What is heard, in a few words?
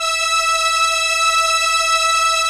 STR ARP, ARP Strings, ARP Slow Str; Roland LCDP09 Keys of the 60s and 70s 1